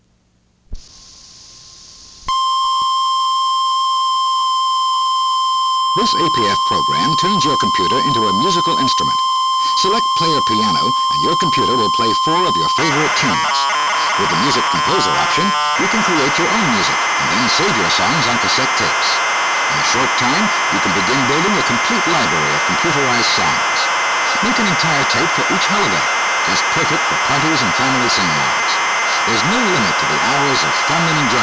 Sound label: Music Composer Player Piano